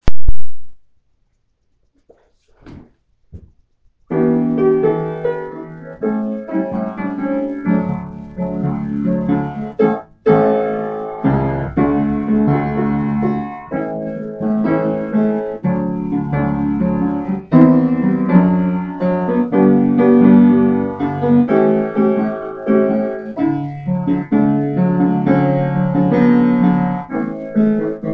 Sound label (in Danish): kom og syng - akkompagnement - d dur